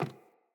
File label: Sandals Run Wood, Footsteps; Player